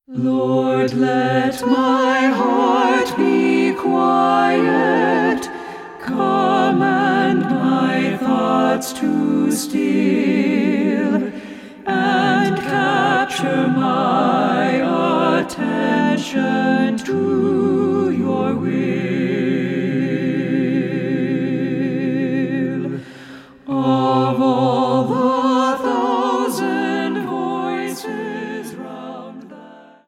Tenor

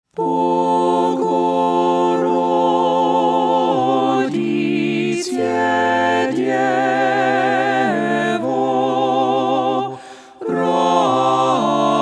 SATB

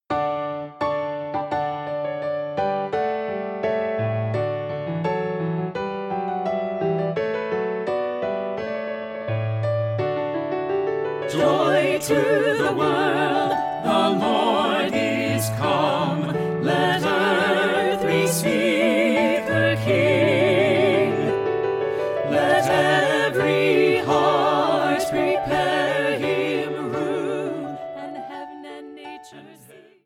Alto

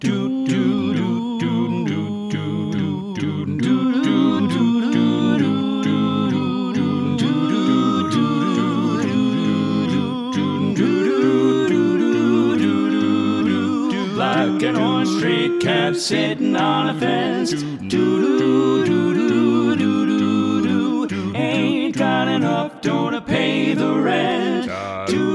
Baritone

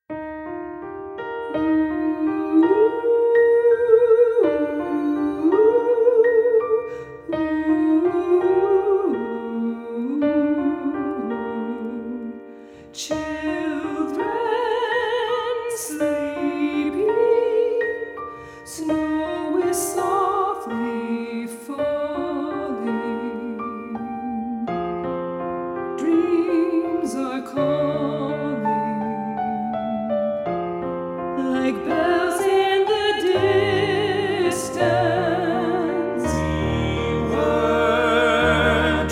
SATB

